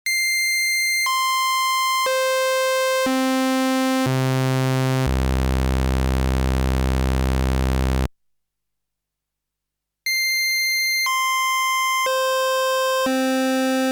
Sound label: Arturia Polybrute Waveforms